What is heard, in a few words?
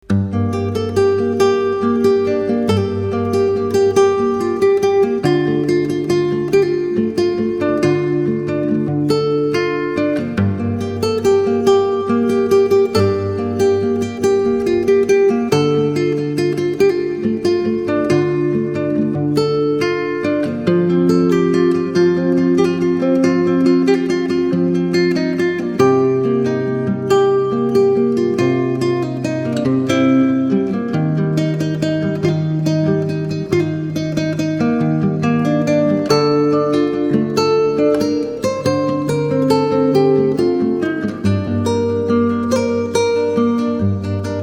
Guitar Instrumental